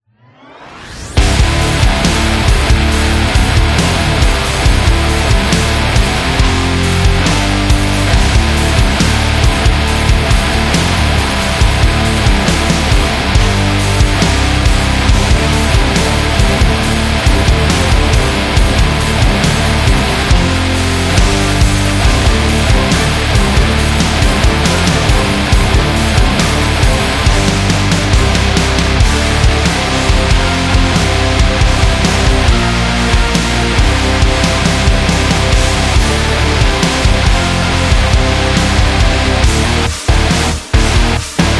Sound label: Strong Man, metal